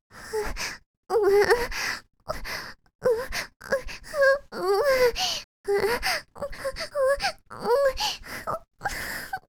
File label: moan; oppaigames